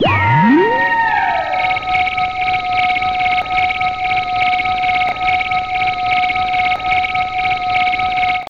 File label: Power Blast